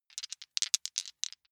big two rattle in hand short

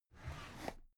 drawer cabinet carton open regular halfway

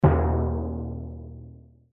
drums low

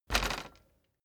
bicycle street bump rear

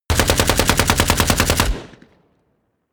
burst close

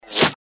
bullet swosh

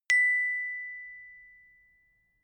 notification glockenspiel single

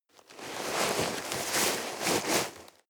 nylon leather medium

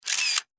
35mm camera shot

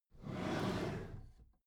packed drawer smooth clatter slide close

